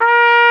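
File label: Big Band Fast, trumpet; Roland L-CDC-01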